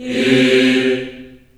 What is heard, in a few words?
C3, Choir